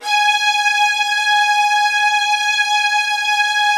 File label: G#5 SUS, LOUD VIOLINS; Q Up Arts; Denny Jaeger Master Violin Library